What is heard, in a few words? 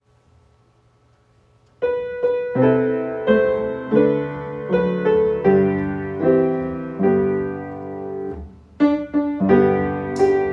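Simple Gifts Accompaniment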